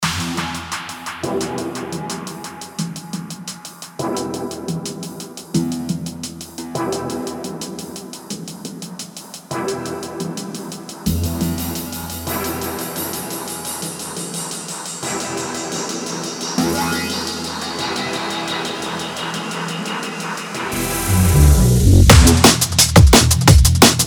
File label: Snap Funk